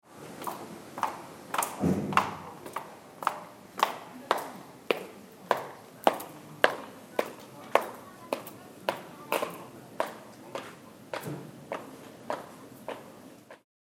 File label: Schritte lady in red